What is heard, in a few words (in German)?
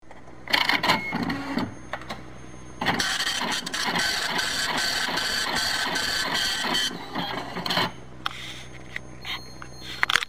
Matrixdrucker